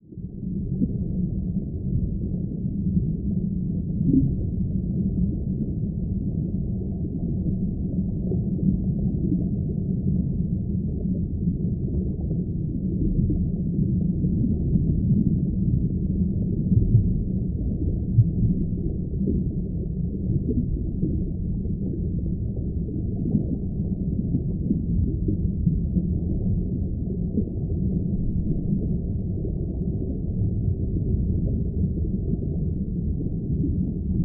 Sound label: Underwater Water Ambience Water Bubbles Movement Deep Gurgle